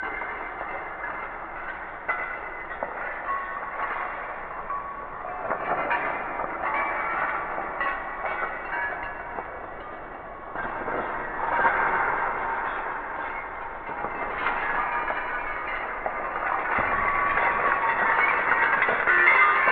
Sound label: pool sfx